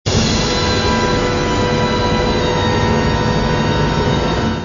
jingle tension

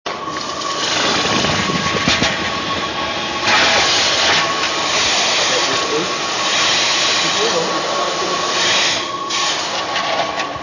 liquide eau

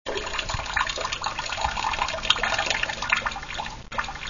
liquide pipi

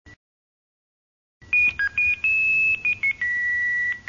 sonnerie

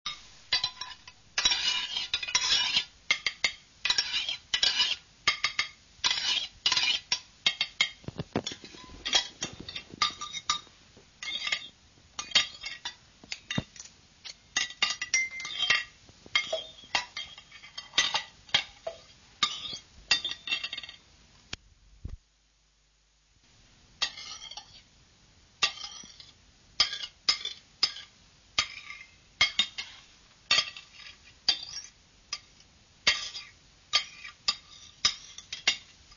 ambiance repas couverts

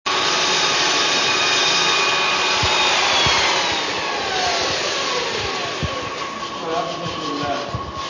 machine ponceuse elec

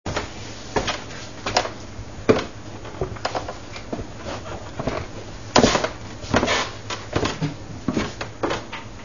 pas escaliers

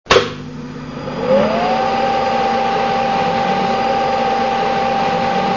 moteur demarrage bruit

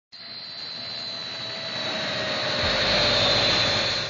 avion

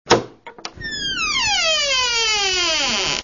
texture grincement porte

